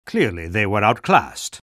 spy cheers; vo

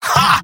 scout laugh short; vo; tf2; mvm

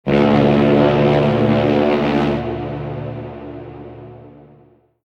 ship horn; Garry's Mod; tf2; mvm warehouse

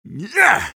sniper taunt flip exert

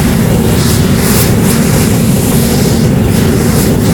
flame thrower loop; tf2

